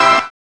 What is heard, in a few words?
TEKCHD